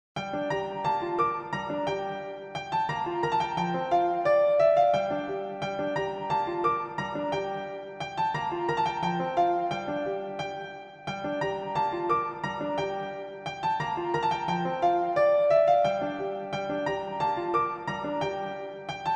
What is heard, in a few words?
acoustic piano